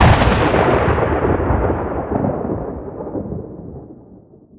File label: bolt huge thunder